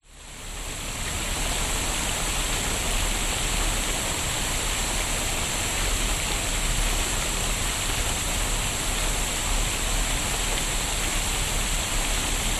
public places park by fountain cars distance botanic gardens brisbane